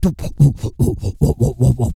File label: REGGAE, Vocal Planet World Voices